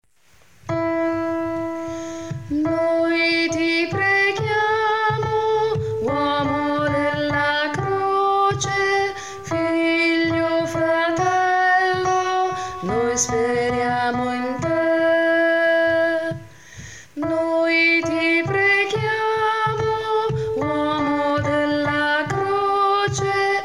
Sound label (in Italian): bassi